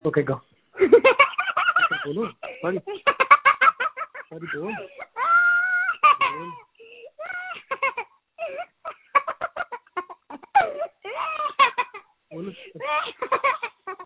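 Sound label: screams that feature laughter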